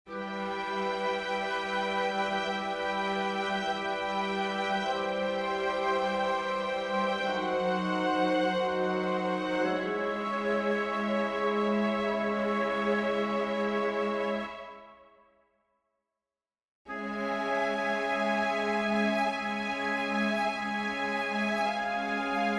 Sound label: gratias agimus tibi for winds strings